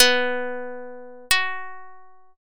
sitar

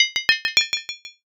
armor

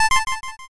chain

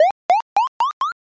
bounce

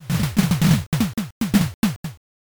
steps crowd

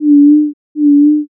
owl

